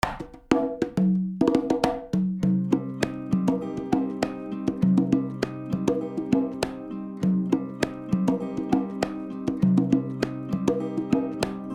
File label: conga